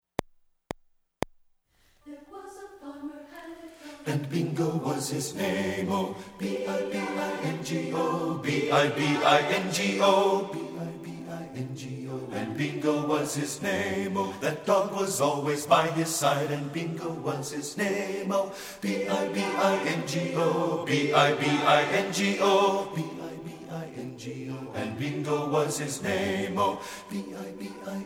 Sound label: BASS; Bingo